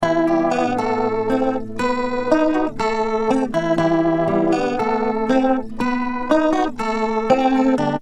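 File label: acoustic guitar Voce spin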